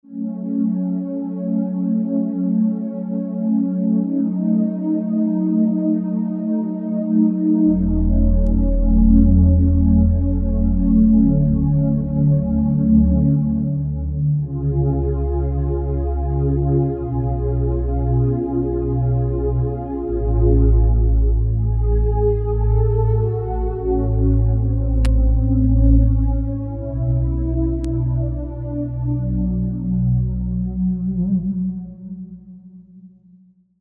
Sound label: Warmer Pd